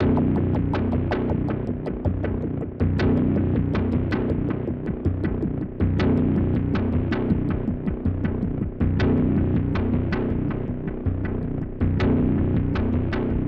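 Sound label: POWER SYNTH LOOP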